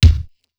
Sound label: SDS2000 BD, Simmons SDS2000; SDS2000 Factory6